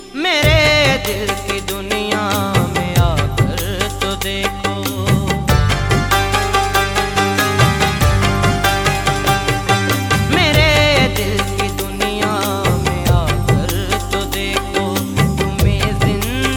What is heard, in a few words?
Sad Ringtone